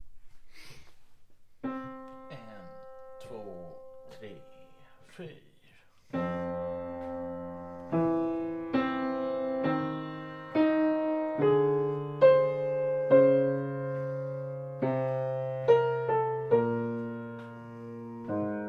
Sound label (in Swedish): Fm komp 3 chorus